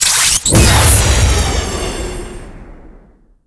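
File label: electric explosion; creepwampz ya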